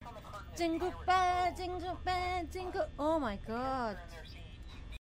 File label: jingle bell; towa